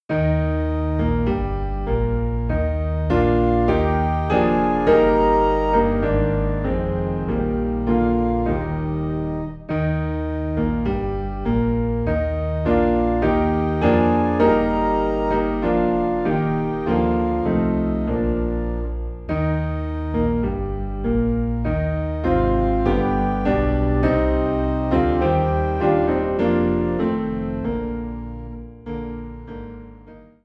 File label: Piano and Organ Low in E Flat; Alleluia Sing To Jesus